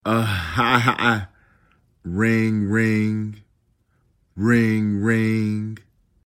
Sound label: AHAHA ring ring